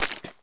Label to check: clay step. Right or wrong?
Right